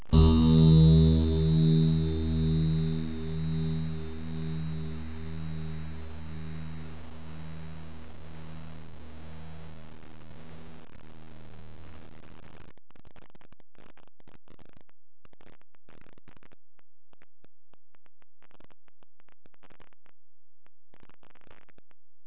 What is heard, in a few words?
mandolin